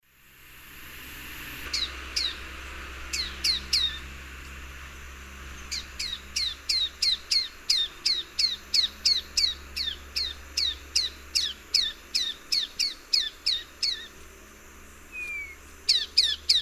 Vireolanius leucotis, excited calls; S. America; Eastern Lowlands

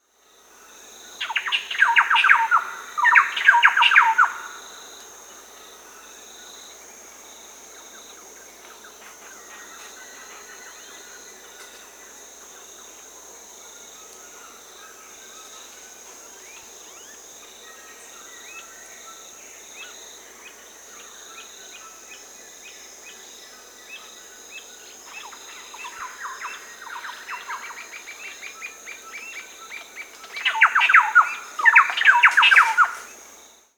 Thrush-like Wren (Campylorhynchus turdinus), Peru; Birds of the Amazon; S. America

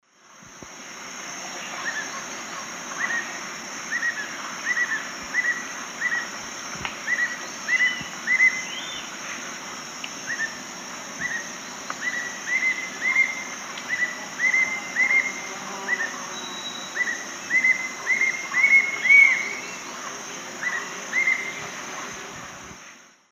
Attila spadiceus, subsong; S. America; Eastern Lowlands

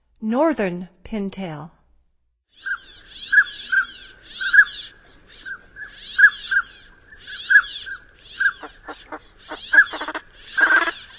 Northern Pintail; North America; Stokes

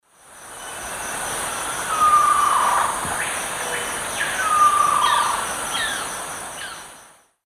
Grallaria dignissima, call; S. America; Eastern Lowlands

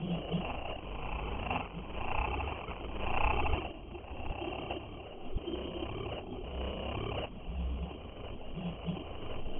Industrial Winter, Soundscapes; Ambient Cinematic

